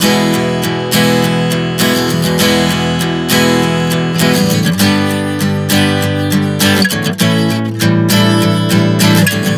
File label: E-G-A 100 bpm, acoustic guitar chord progressions